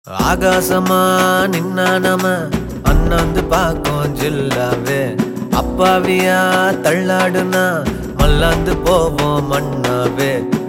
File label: Love Bgm Ringtone